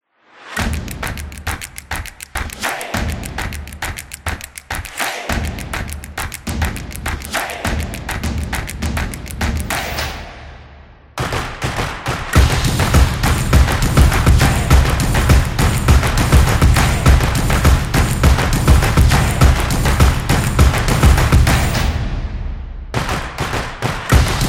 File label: Clap Energetic Sport Drums